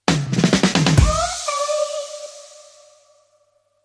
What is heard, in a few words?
Soul Beat, Sting